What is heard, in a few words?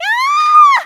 Scream; Seres screaming; Zelda